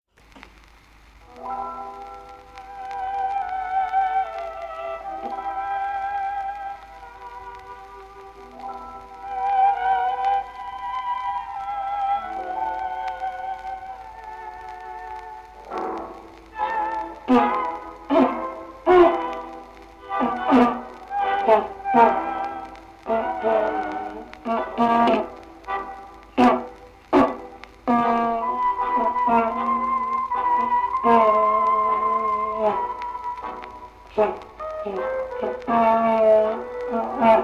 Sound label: melancholic tango